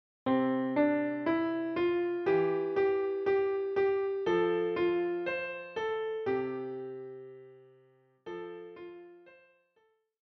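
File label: piano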